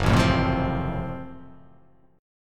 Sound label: F sharp Minor Major 7th Flat 5th, guitar; chordshape; down